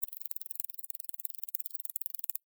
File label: Insect Legs Walk; Insect Movement Sound Effects; Insect Movement (Sound Effects:Animals:Insects); SFX Bible 6